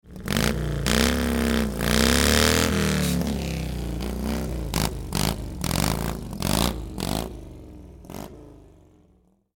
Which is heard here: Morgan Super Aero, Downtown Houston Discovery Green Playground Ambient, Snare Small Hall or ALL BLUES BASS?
Morgan Super Aero